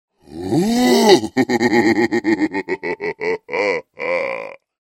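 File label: sounds of giant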